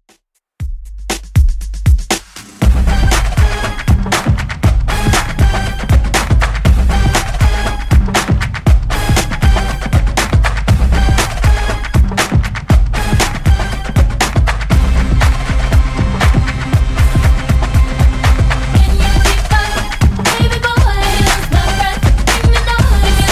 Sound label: 2ks DJ Friendly Redrum 119bpm